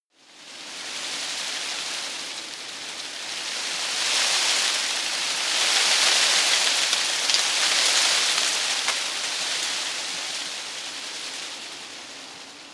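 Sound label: tree foliage rustle heavy, garten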